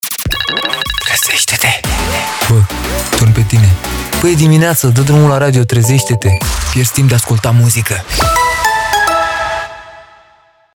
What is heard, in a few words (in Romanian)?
ALARMA; JUNO